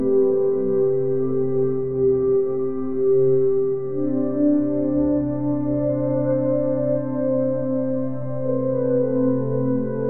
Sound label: minecraft style titl; smooth end